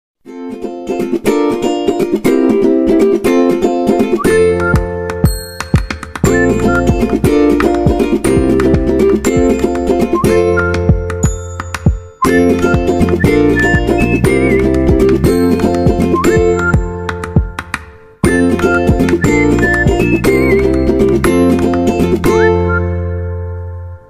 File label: Ukulele, alarms